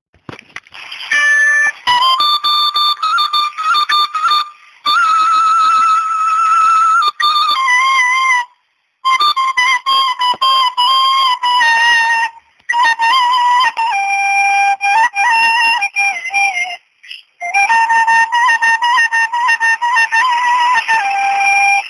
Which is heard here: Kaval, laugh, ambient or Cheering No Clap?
Kaval